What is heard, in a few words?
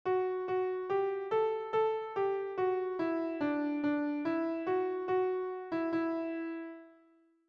rythm